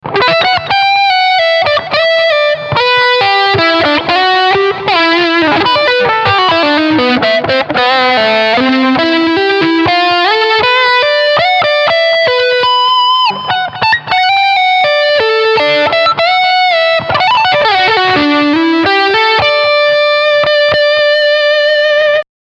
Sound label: Leadsound Middle PU